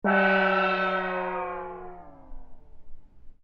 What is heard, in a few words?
gong down